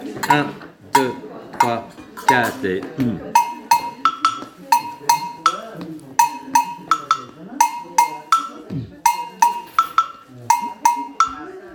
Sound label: Agogo theme, samba reggae